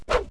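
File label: swing miss; impacts; Wired for Sound Pro CD; Aristosoft, Inc.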